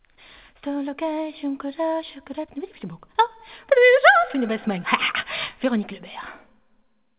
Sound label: vocal